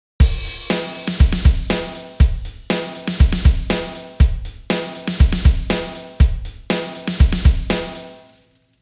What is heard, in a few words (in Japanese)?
シェイク; drum-yougo